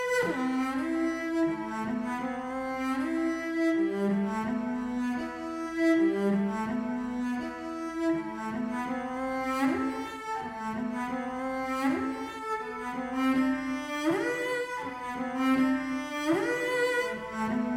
C 3-4 (Solo Cello)

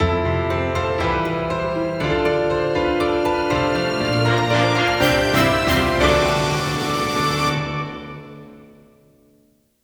Fanfare; luna sanctus windows gamerip 2019

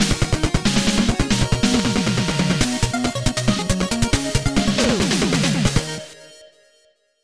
Snow Sting

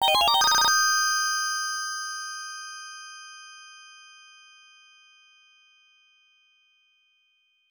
1UP Cheat SFX; Gemini Wing; X68000

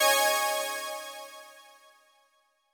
Clear Db; Mixolumia

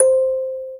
Vibraphone C

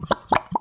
Gumbo Bubble

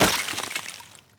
ice break

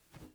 glass jump

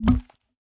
paperlauncher paper gun; teardown ps5 windows xbox series xs gamerip 2022

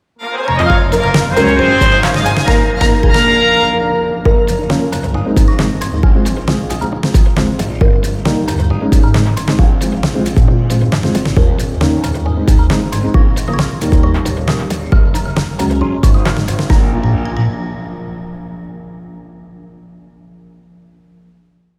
Boss Defeat - Jingle; Touhou Lost Branch of Legend

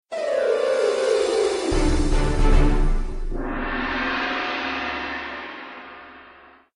Music Boss Lose; Letter Quest: Grimm's Journey

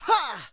move up; Hank

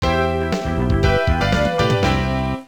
Stage Clear; Puzzle Uo Poko; Cave 68000

